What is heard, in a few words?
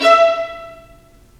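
violins marcato, String Sections; Roland LCDP13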